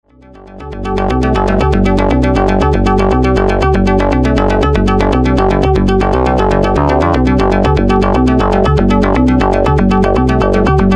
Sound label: bass and high; Touch Seq